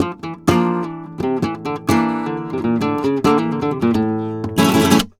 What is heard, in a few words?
Remates De Pulgar, WAV Guitar, Flamenco